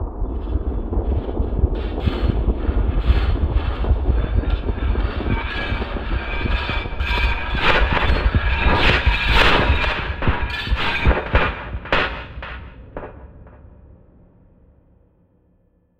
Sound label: Scenic Hybrid Instrument Scrapyard; Reason Studios Reason 11